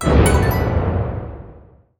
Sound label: fire remnant stop; weapons; dota; ember spirit